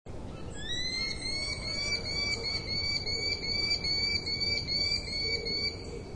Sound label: Female Solicit, merlin